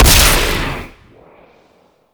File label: Amplifier Barrel Fire; Weapons; Operators Amp Barrel Part B Fire B; Warframe; Amplifiers